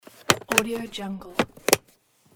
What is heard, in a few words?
close and open plastic box; audiomechanica2